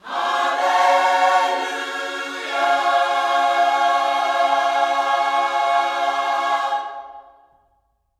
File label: HALLELU, Gospel; Spectrasonics; Vocal Planet; HALLELUJAH